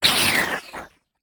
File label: biter death; factorio; Factorio 0.14.21; creatures